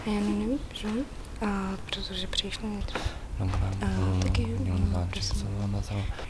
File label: whimper; Mafie 1